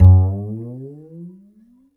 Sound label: Upright Acoustic Bass; 1ST POSITION; Larry Seyer